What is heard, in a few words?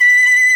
SHIAO B4